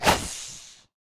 victory snake strike; Smite; Cerberus

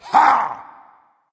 grunt attack dragon; fafnir

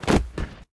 hands drop; smite; kuzenbo

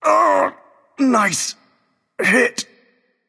death; smite; voicepack; thor

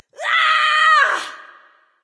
battlecry; smite; voicepack; skadi

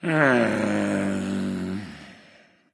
sigh; smite; ahpuch

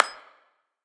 clap; smite; poseidon

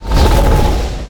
slither; smite; kukulkan

